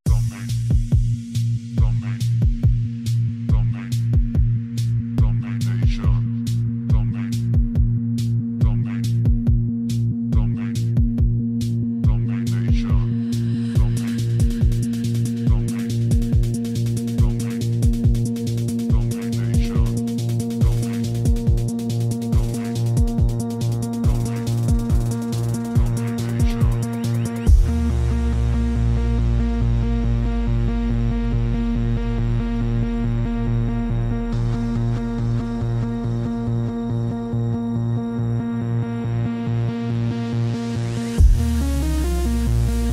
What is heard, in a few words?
trap remix bass boosted